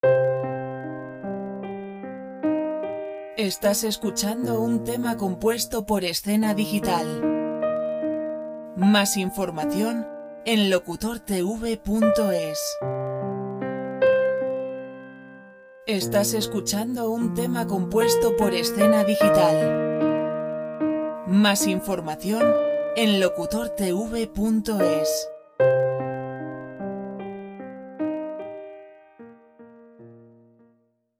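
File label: pianos87 lent, relaxing background music; Relaxing free music; melodico; Royalty-free music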